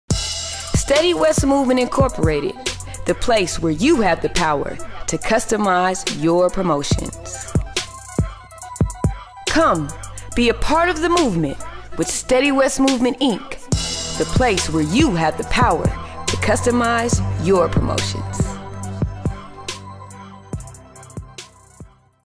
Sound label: Logo Promo